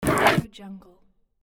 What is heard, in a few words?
foley item grab pickup